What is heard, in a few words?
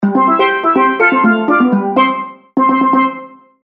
Steel Drum Solo; rocktopus